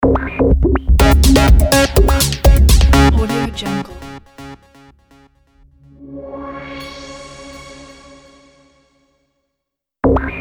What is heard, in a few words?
electro blob logo